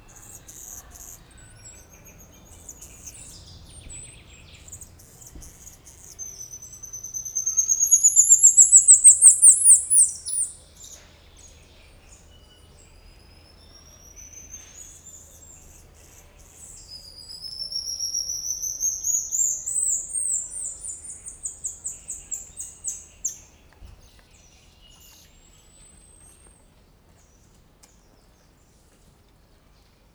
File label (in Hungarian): erős hangokat hallat, aranyfejű oroszlánmajom; szegedi zoo 2011